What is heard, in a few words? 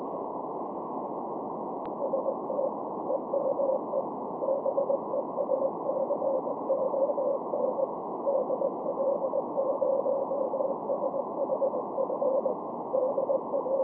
10GHz EME BEACON 10368MHz 500W; DL0SHF